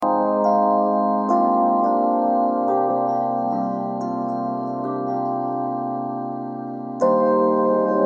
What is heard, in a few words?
battery burial